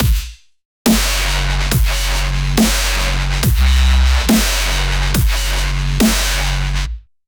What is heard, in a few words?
dubstep hard bass kick